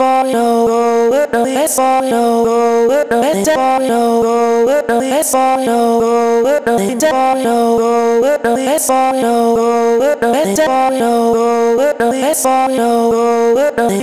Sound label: vocal chop, 135, Gm; FallorGo; Slate Digital Omnivox Vocal Sample Pack; Chops